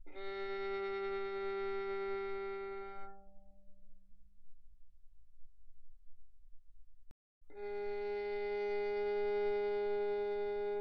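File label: Violin arco pianissimo sul G G3 B3; Strings; violin2012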